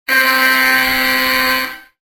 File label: ALARM